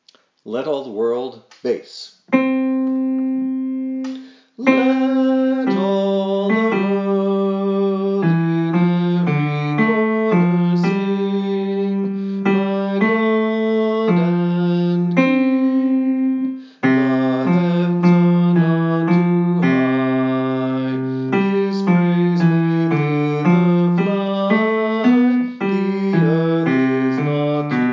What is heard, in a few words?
Bass; Whitehead; Let All The World